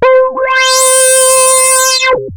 OSCAR C5, synthesizer; Hollywood Edge - Giorgio Moroder Rare Synthesizer Collection

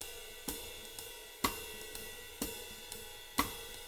SWING, Living Drums; Sampleheads; Peter Erskine Living Drums

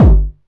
BD, Drum & Bass, 160 BPM

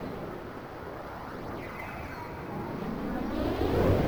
FX Intro, Drum & Bass; Sonic Foundry (Sony Creative Software); Knutrix Drum & Bass

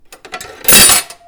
fooley; M8; percussion and foley

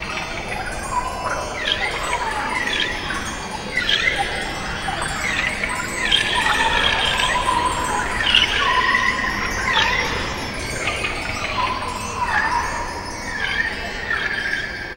Pad; Dream Zone

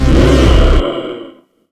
BASS DRILL; USB Soundscan vol.33; AKAI; Disjointed Textures

